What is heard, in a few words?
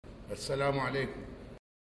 loop